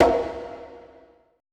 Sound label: BONGO R; BPC DRUMS